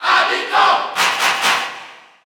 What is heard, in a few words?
Male Cheer French; Villager; SSBU